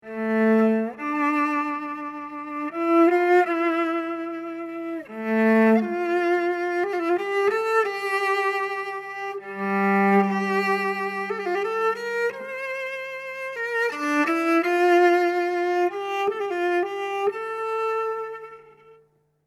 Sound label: Alto theme